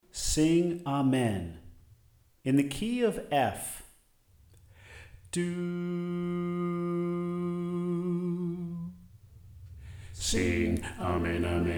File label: Bass